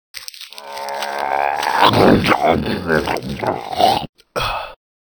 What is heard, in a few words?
eat; food; midnight guns